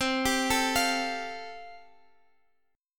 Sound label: A Minor 7th Sharp 5th C